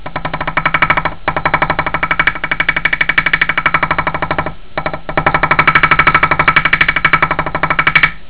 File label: vibrate